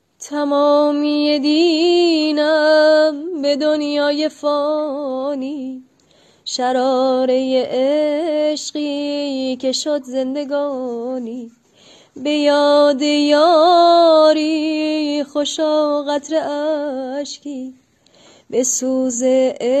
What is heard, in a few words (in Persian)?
Ba Sedaye zan